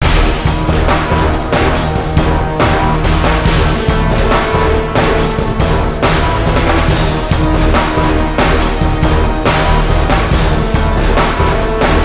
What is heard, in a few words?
Building Tension; command and conquer generals soundtrack